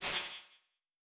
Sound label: Multimedia Internet CD-Rom Flash Beep Electronic